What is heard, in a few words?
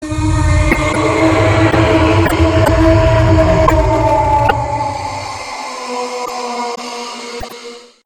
attack; slender; yetitubby